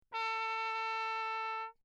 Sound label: TRP MUTE Lechner; CrownAlu; Perinétventiltrompete; Mutes